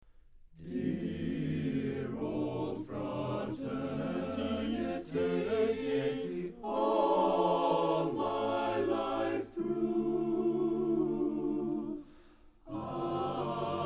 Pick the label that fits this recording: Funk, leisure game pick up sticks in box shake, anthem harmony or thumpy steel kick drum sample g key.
anthem harmony